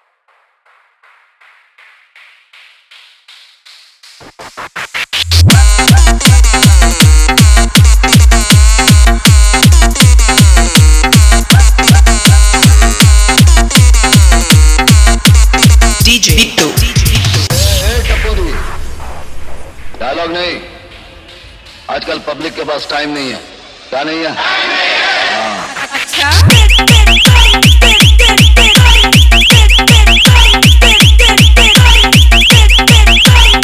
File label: New Competition Remix Sound Testing Dj Song